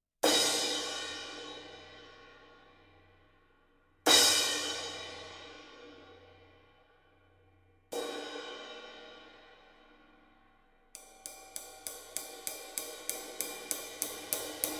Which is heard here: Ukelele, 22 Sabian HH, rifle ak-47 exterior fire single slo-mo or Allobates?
22 Sabian HH